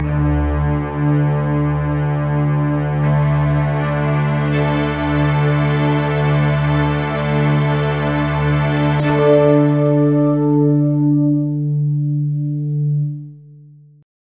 fzz chime

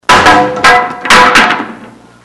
falling; drop

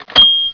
switch; yoda; PC Gamer 44

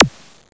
click

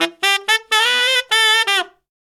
Saxy; Logic Fun

